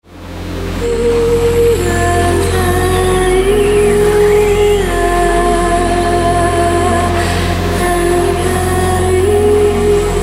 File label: distort